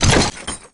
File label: death Machine; endless world idle rpg gamerip 2019